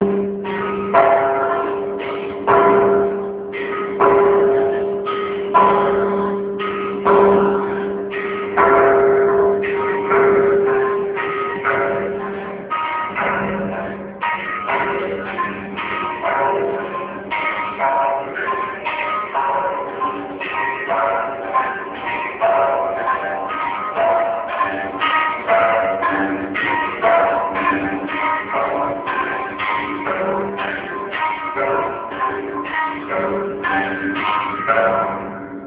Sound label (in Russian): zvon, vstrecha